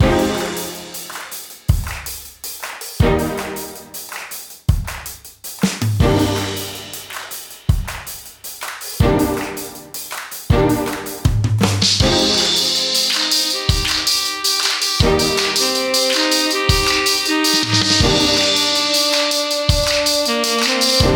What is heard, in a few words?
space jazz